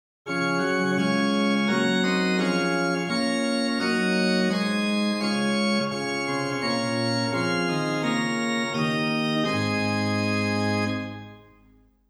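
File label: Gedackt 8 + Rohrflöte 4 + Octava 2 + Sufflöt 1, organ; Reinhardtsgrimma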